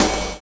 Dose HiHat; Sonic Foundry (Sony Creative Software); Drums & Drones; Construction Kits

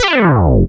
BASS SLIDE; DANCE

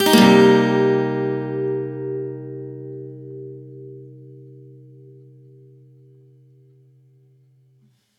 One Shot C min7 upstroke, Acoustic Guitar; Sonic Foundry (Sony Creative Software); Songwriter Acoustic Guitar Companion

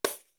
R8 PERC; Greytsounds VOL-1

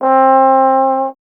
Trombone C

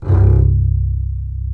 Spicato Basses & Celli; OMI - Universe of Sounds; EII Factory Library

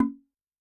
Log L; Yamaha DTXpress-II; Perc

